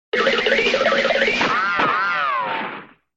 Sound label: cartoon